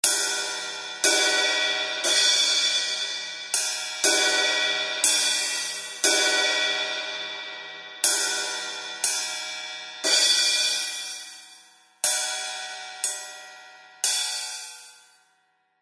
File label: Zildjian A Custom 14 Inch Hi Hat Cymbal Loops